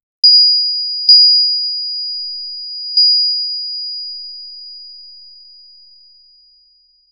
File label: sharp quick but soft chim; chime